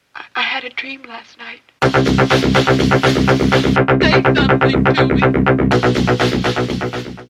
Synth

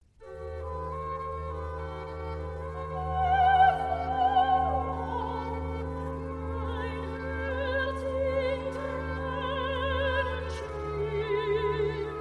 Recitative (Soprano); St. Matthew Passion; Wiewohl mein Herz in Tränen schwimm; Matthäus-Passion BWV 244

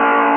loop

